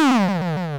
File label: Explosion